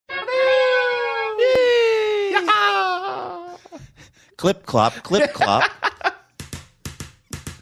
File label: making fanfare clip clop sounds